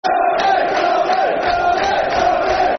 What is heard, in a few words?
Red Army, Red Army, Red Army, Football Chant; Swindon Town Stfc